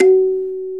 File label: SANZA F#3; AKAI S-Series CD-ROM Sound Library VOL-5